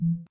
focus, UI; Minecraft

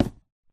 wood, dig; Minecraft

